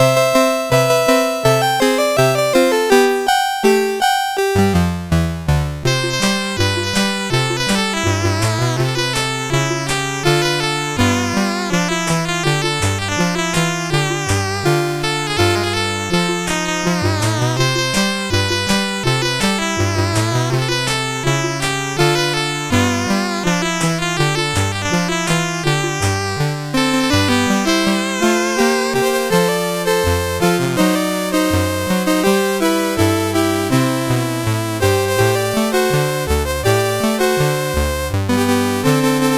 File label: CSG8580R5; Twisted Christmas